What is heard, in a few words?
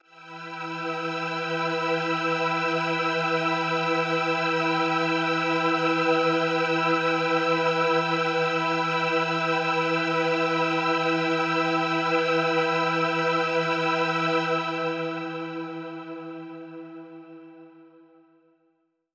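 Mach String E minor, synth orchestra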